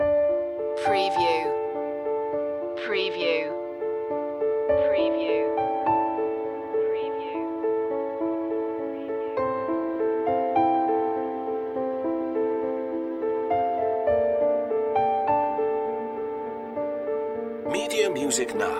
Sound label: autumn piano loop